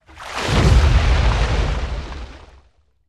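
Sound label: Small Underwater Explosion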